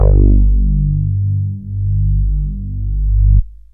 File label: synth bass